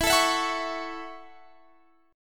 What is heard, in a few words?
Gb Minor 7th